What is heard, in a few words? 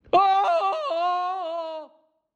Man Screaming